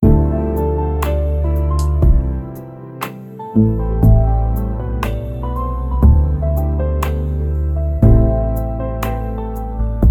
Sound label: chillout music nice and